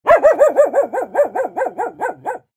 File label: Medium sized dog bark